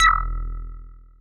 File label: Voyager Perc, Moog Voyager